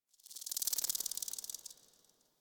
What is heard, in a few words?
Insect Night; Ambience; One Offs; Warframe; Eidolon Plains